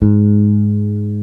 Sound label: BASS G#3, ELEC BASS; East West - Global Sounds